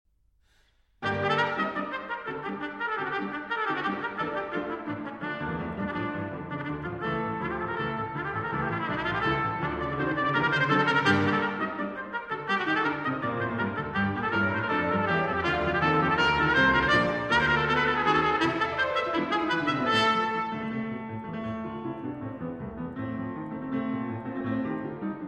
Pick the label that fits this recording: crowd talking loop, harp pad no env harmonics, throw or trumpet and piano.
trumpet and piano